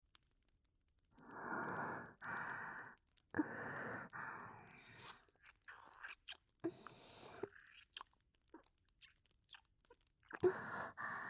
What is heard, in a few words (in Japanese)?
愛情たっぷり囁き耳舐め, ASMR